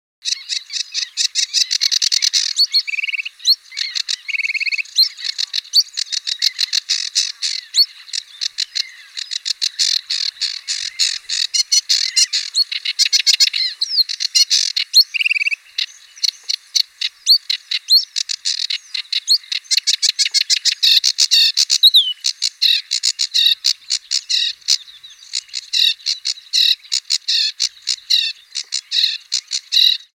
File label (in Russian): kamyshevka barsuchok golos